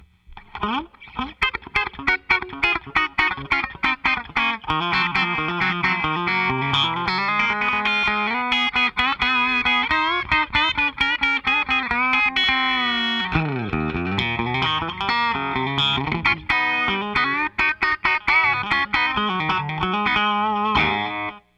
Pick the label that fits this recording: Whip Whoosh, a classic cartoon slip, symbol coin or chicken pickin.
chicken pickin